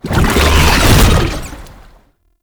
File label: Latch Start; Roathe Summon Gulphagor; Warframe; Tau